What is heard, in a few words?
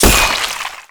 glaive hit